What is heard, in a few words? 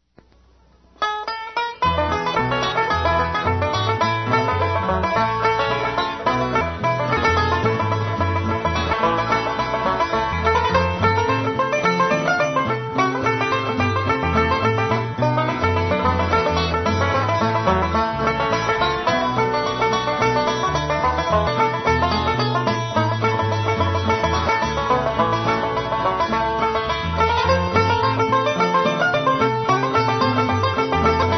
Texas Blues, banjo